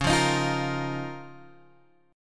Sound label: Db 6th Add 9th Db down Guitar Open G